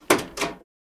Buick 65 Hood Open Shr, trunks & hoods

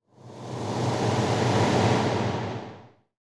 Medium Speed Eerie Steam, steam blasts

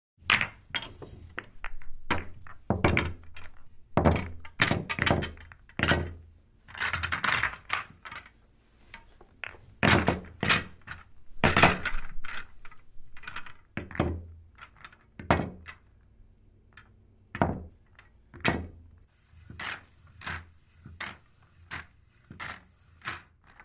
Pool Game Sequence Bi, billiards

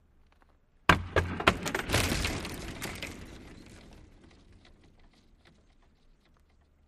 Hockey Player Stick

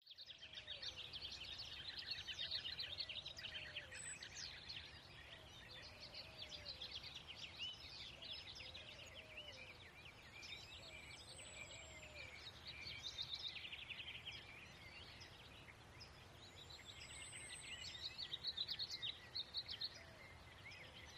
California Birds Ins, country

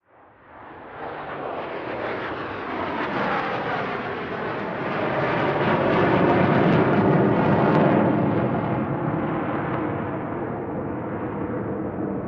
F/A-18 flyby

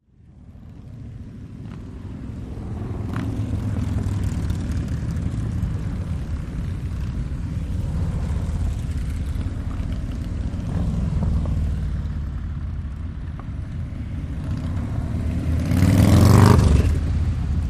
Multiple Motorcyle B, harley davidson

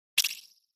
Button Elect Bell Zip

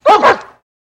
Dog Bark Double

